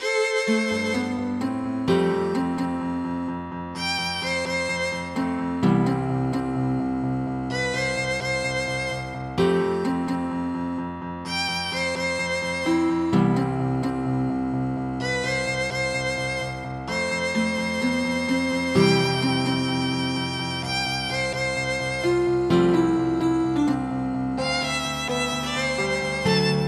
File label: from midi